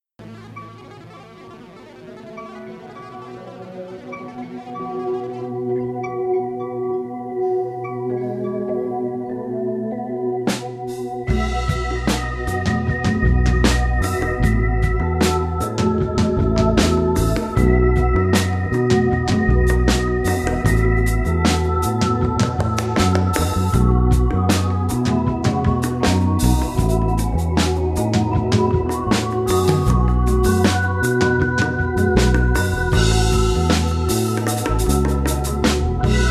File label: Inst